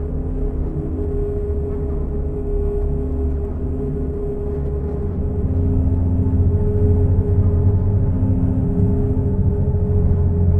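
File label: Abyss Ambience Loop; helltaker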